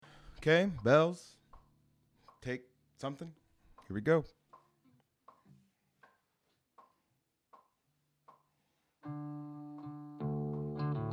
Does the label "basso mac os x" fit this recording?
No